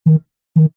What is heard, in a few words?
household cellphone vibrate wood surface two blips; zapsplat